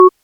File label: F#4, Synth Arp